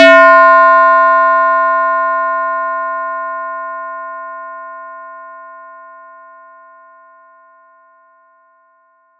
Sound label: FX RINGR; ModelCycles